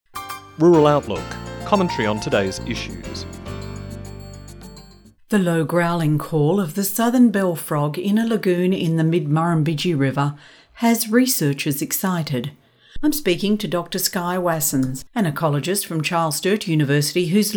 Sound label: Southern Bell Frog